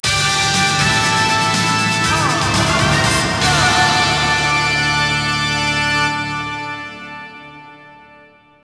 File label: Str G#